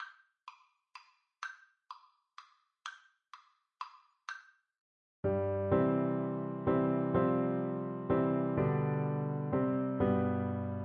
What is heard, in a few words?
three ships, Flute